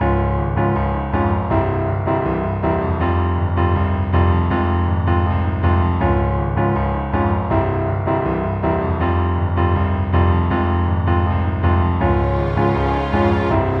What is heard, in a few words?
groovy fight; WarHorse